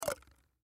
Cups Plastic Stack, Cups Plastic Stack Rattle Childrens; Household; Blastwave